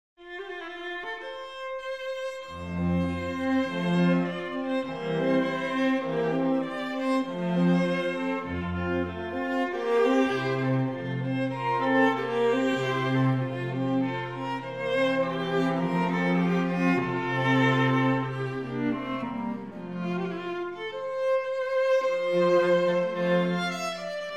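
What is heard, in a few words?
quartet; viotti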